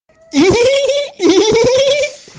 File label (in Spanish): risa